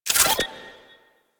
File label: Map Marker Destroy; Open World